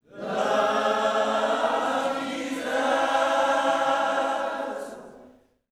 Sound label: LOVE JESUS, Vocal Gospel